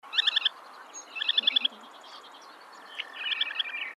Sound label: SANDPIPER SEMIPALMATED